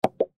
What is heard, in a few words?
Suction Cup, Suction Cup Foley Left Hand; Cartoon